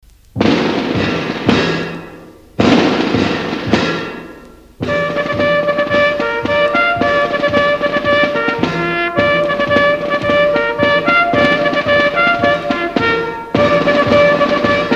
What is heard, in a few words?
Band